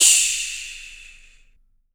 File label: CYMBAL, MALE PERC; Spectrasonics Vocal Planet; Jazz FX